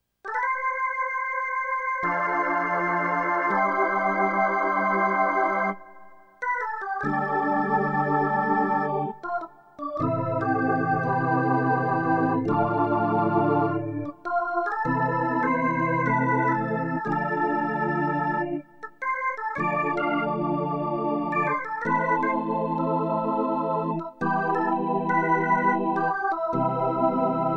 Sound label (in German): Orgel